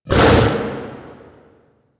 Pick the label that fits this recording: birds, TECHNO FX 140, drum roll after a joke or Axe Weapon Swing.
Axe Weapon Swing